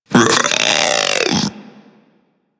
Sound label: Infested Splitter Split Top Vocal Attack Medium